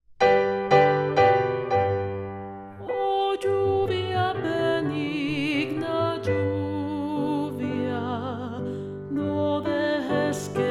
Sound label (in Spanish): Lluvia de verano segunda voz